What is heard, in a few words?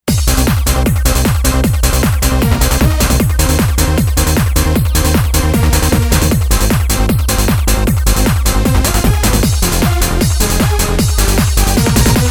Hard House; EMX